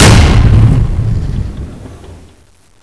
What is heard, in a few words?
explode